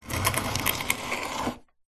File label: foley wood box small slide short hard ground; zapsplat